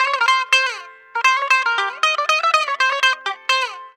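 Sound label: electric bouzouki; EL.BOU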